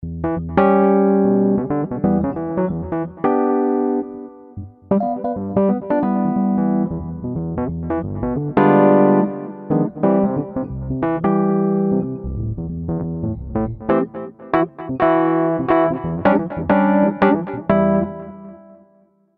Electric MK1 Soft Ballad; EZKeys

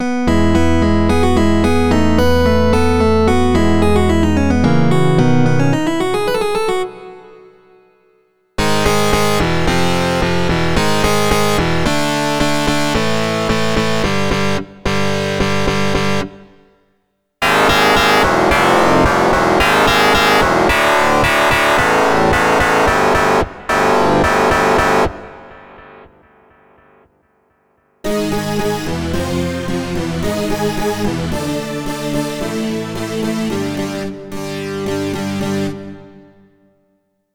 Synclavier Guitar

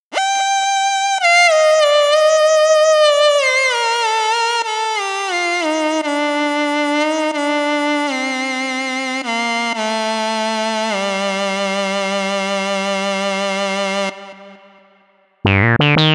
Lead

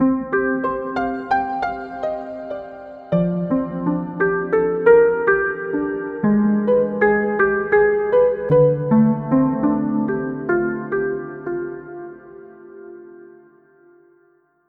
Piano Soft and Large

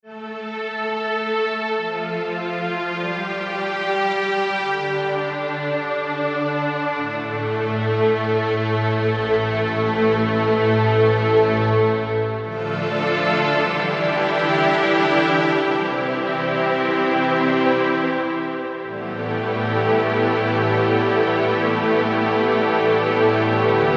strings; wusik

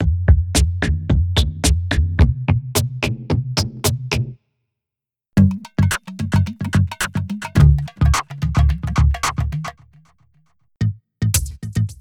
Loops Pop Hop; CinemorphX Presets SC

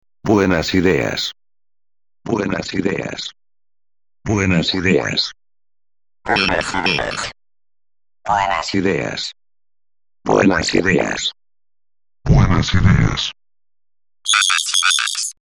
FREQUENCY SHIFTER Vox; CHERRY AUDIO RACKMODE

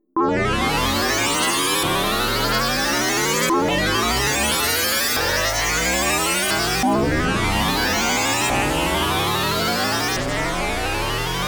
Synclavier SFX

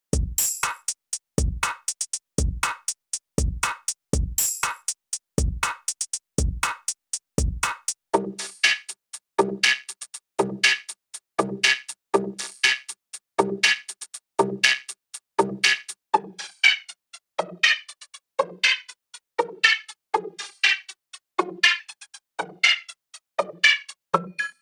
Vocoder Drumloop; VOCODER FX; CHERRY AUDIO RACKMODE

